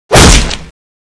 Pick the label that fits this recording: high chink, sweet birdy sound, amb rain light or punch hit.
punch hit